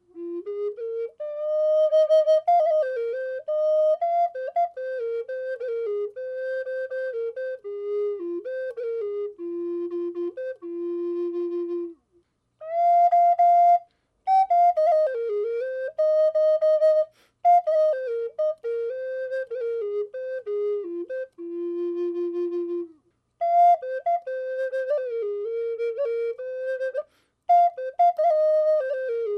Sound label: sound sample Fm, flutes